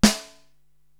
SD Dry, Korg TR-Rack drum machine

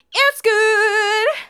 Female vocals and robo-vox

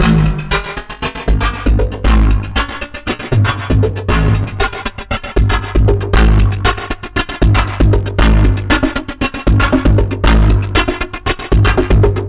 flange; flange of doom; Polyend

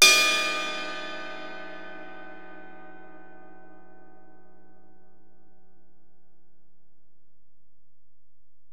Ride, Alesis DM-PRO

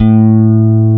A2 TELECASTR, Bass Guitar; AKAI S6000 CD-ROM - Volume 1

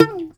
SHUR NOTE, TOPSHUR NT; Soundscan vol.60; Asian Traditions; AKAI

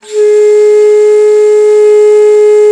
BASS BONS, India Winds

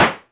Elektron Monomachine, Drum Machines

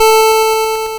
NOTE A5, LSDJ; LIMAT LSDJ kit; LSDJ Notes; NOTE KIT 6; Drums

